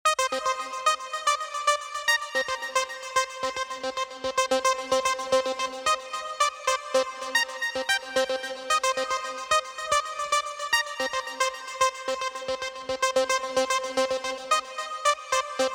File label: Hey Lead, retro 80s synth wave; retro 80s synth wave presets abx3 vol1